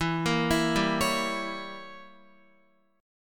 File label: E Diminished 7th E, Guitar; Edim7 arpeggiated; JGuitar